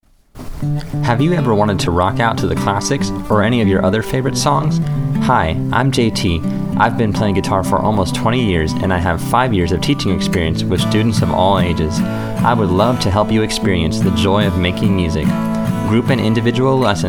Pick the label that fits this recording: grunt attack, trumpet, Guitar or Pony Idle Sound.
Guitar